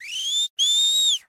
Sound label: marci taking damage; dota